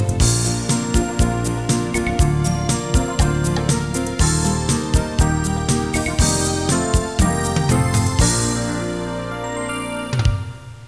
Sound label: ballad; QChord